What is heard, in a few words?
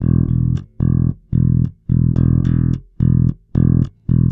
110 Pop Basic Bass E, E-Bass; Pop Rock